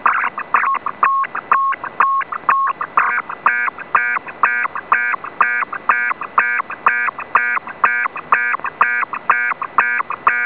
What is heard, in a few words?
RS240-81 ISS IDLE